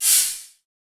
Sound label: Shake Wind; FREE SFX PACK VOL 2